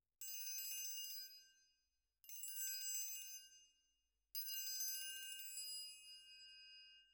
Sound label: Perc